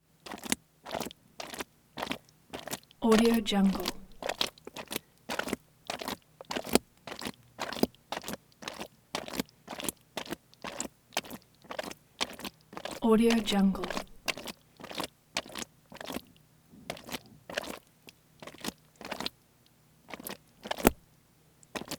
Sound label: squishy footsteps on plexiglass muddy; prosoundfx